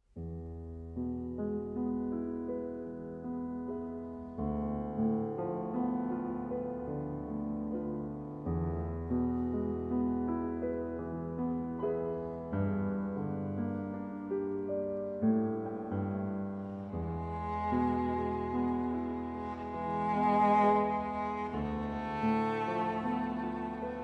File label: Cello and Piano; Beau soir, L. 6; Aleksandr Grechaninov